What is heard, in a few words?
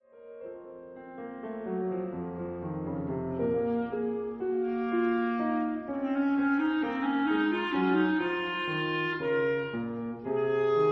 Clarinette Sarabande